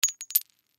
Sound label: Chalk Piece Drop Cement; Foley; Blastwave